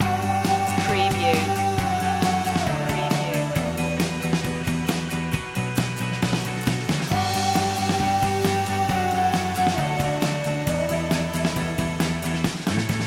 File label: rainbow pop loop